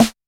snare, electronic

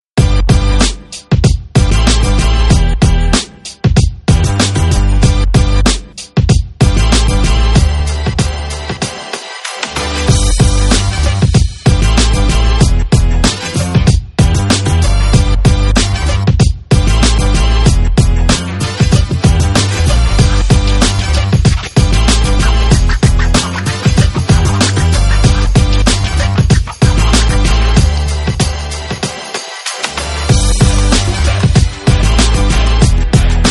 main music

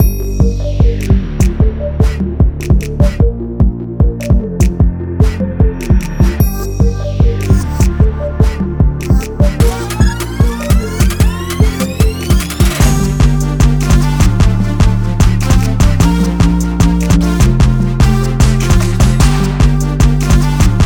jersey club

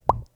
message tip

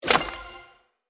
buy boost

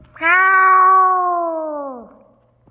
meows

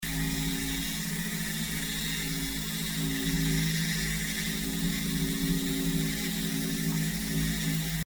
laser loop; ThreeHeadedDragon

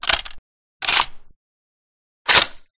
mosin reload

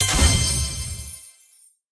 vine Respin Add; jumanji

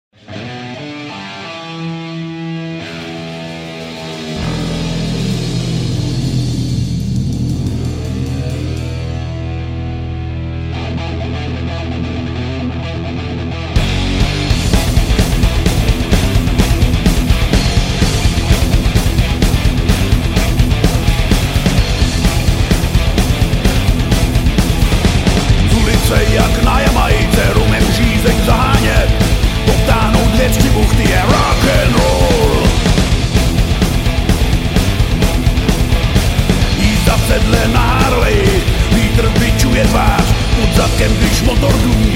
rock n roll

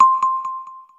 Countdown

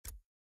ui screen nav forward; everdawn

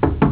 knock

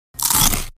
eat

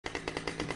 chainsaw neutral

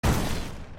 explosion

